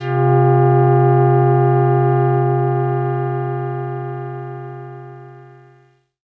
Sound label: SYNTH Soft Multi Brass